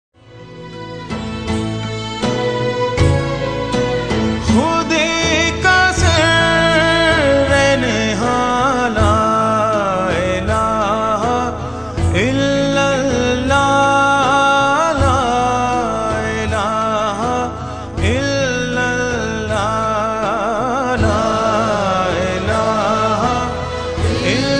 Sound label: islamic kalma ringtone naats